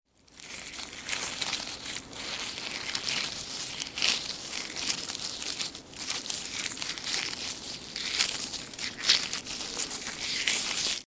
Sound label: dry leaves autumn